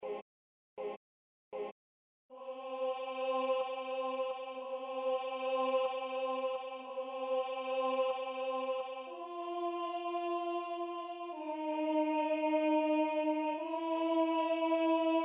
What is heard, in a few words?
alto solo